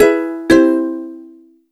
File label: Original Tips, Message tones; Huawei Honor 3